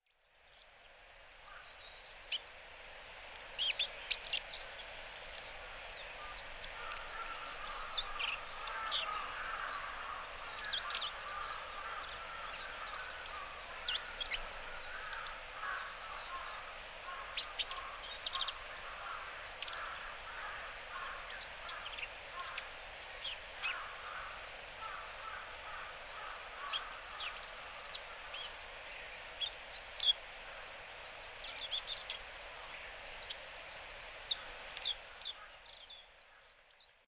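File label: Goldcrest, Hyogo; koe; kikuitadaki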